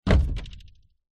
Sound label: household wardrobe door open freestanding wooden